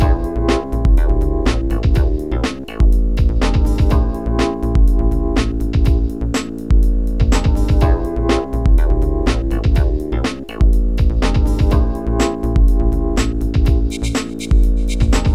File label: Corporate